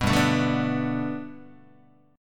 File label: G Major Add 9th Guitar, chord; Gadd9 strummed; JGuitar